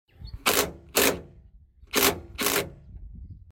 Impact

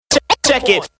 Check It Sms

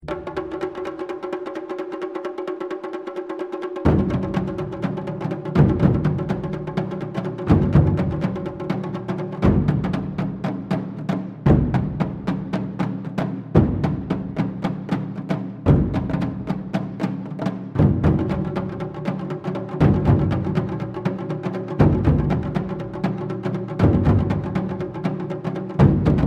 Epic Drums

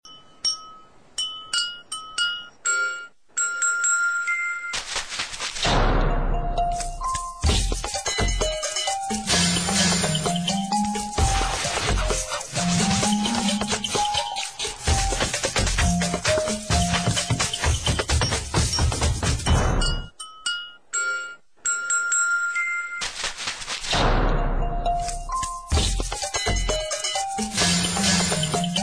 Techno; Airtel

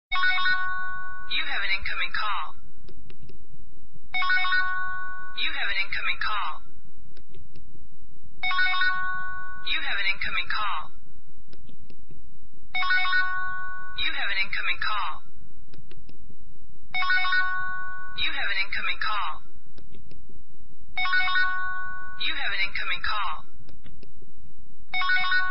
Incoming Call

